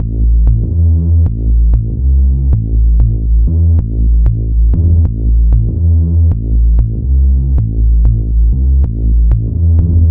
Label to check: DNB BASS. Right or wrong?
Right